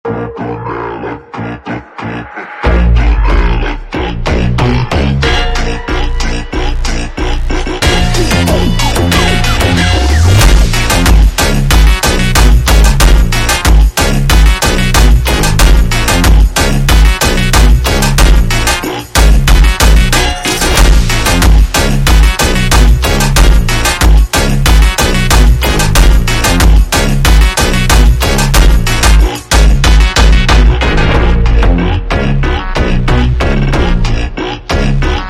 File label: Montagem Slowed